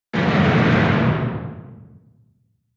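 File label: distance ger jet fire; Hearts of Iron IV Death or Dishonor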